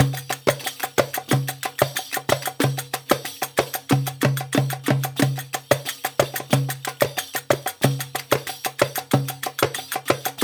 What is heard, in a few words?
Percussion Ensemble 92 bpm; Loops; Drums; Ableton Live 12 Lite; Core Library